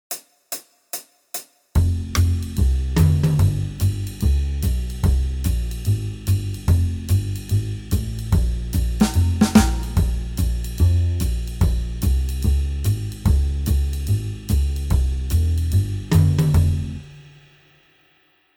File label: Jazz backing; Guitar Techniques 2018 Issue 289 December